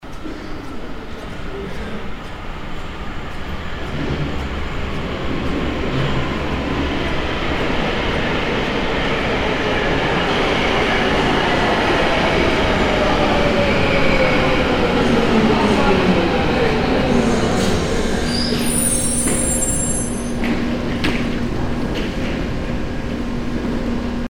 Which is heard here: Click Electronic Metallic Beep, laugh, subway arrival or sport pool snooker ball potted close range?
subway arrival